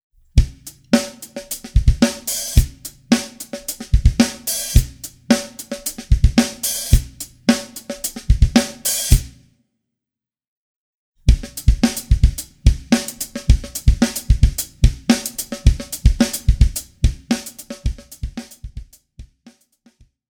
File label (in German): Baukasten 2; Schwarzes Album; Bum Bum Tschack Grooves Fills